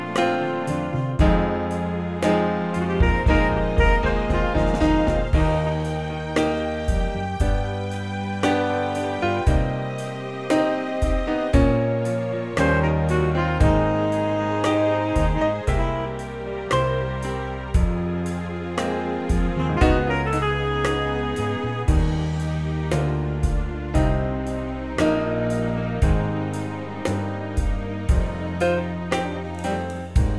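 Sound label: Eb, backing track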